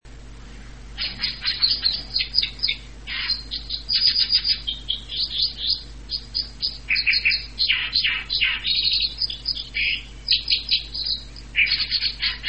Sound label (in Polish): pluszcz; wroblowe